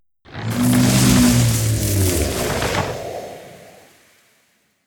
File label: Treant Leaves; Treant Leaves All